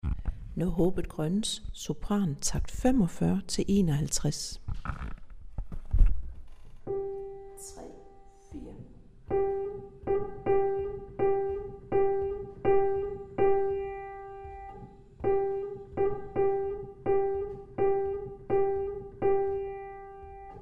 Sopran

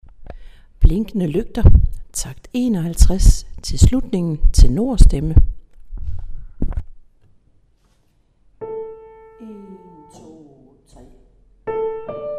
Tenor

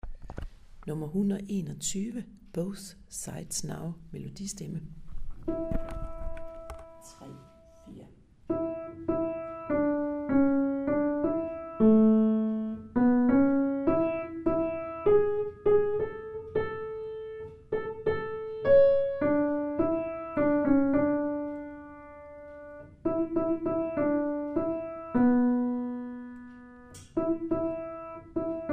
Melodistemme

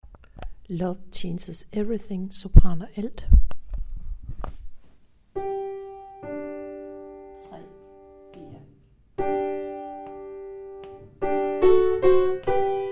Sopran og alt

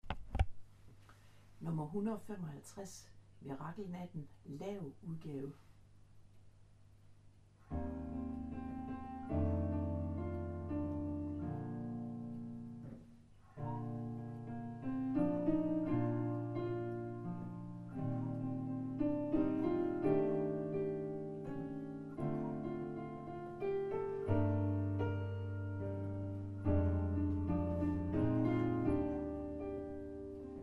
Mirakkelnatten, lav udgave, Akkompagnement

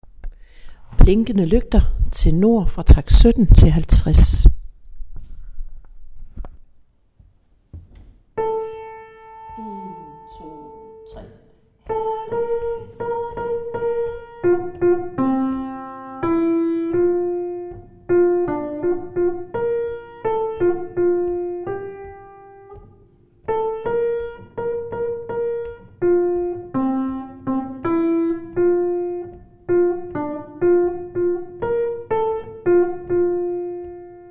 Tenor

